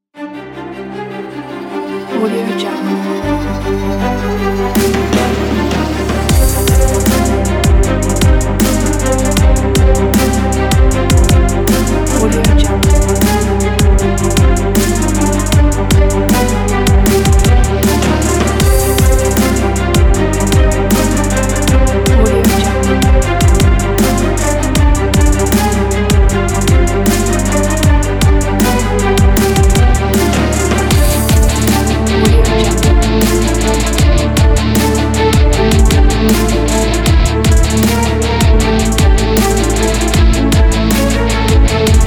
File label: Heroic Epical Hip Hop